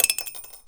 Coin Toss Into Tip Glass; SFX Kit; Foley - Rattles

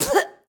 Boxing Female Spit Mouthguard

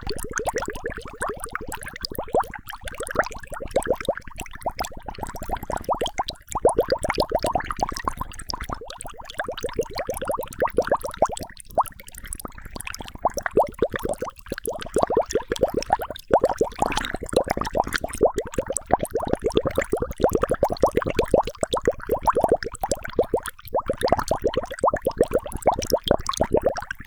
bubbles; liquid; NS Studios - ultra sound collection

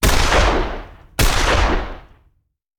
Shotgun; Sound Ideas; Guns

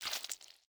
step gore; DeadFright

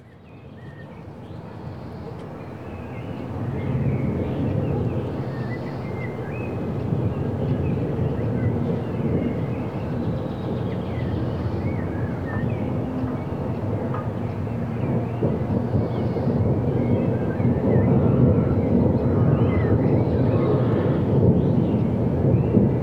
thunder rolling distant; best service Blue Box; rain thunder

